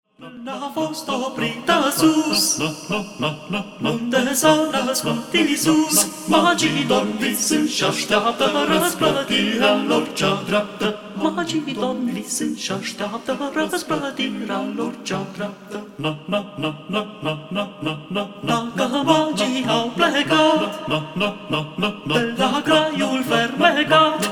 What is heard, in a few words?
Voice Quartet; Plecarea magilor; craciun